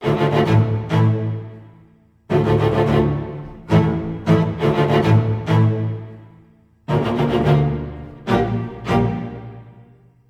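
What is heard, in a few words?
D minor, Low Strings, 90; 90 s Sample CDs; PowerFX - Downtown Orchestra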